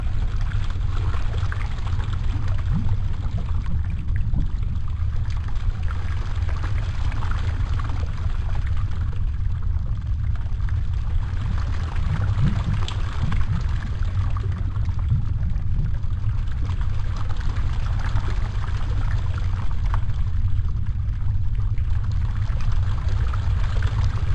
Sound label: magma lake